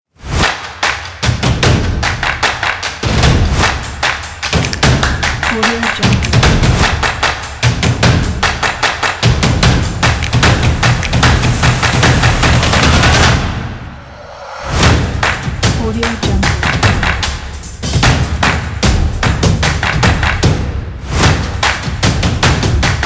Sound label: powerful stomp